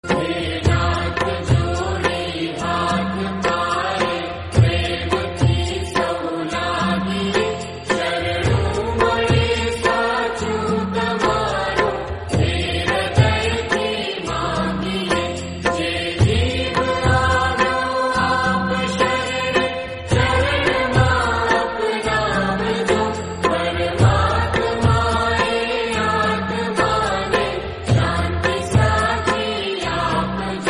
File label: Gujarati song